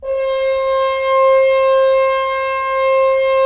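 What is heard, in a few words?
Solo French Horn; Roland - LA Composer vol. 4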